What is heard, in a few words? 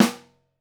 SN1 Overhead, Snare; Ableton Foundation FreePacks; Snare Designer